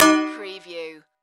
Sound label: Impact Pot; Action, Impacts & Explosions